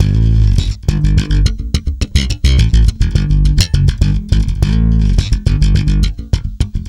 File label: A, tweaky, bass; Spectrasonics; Bass Legends